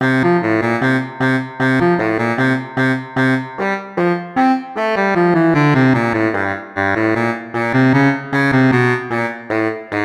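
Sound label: baritone sax fast; Region 23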